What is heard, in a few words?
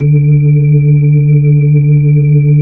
B-3 Chorus